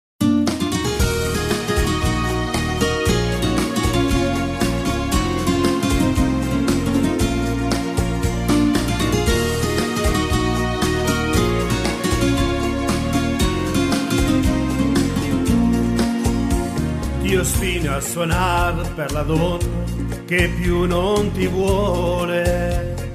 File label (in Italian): chitarra andalusa